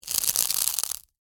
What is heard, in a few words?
Crunch Rip Tear, Floral, Foam; Texture; Crunches; Objects; BlastWave